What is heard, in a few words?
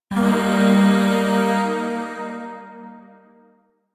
Shawzin Pent Min Chord J; Music; Chords; Warframe; Zariman Void Shawzin; DE